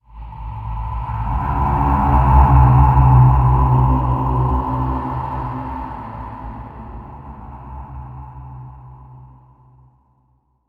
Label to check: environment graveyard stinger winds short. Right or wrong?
Right